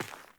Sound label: Sandals Run Rock, Footsteps; Characters; Player